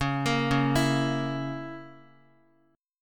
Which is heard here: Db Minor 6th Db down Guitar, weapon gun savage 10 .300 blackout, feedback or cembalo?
Db Minor 6th Db down Guitar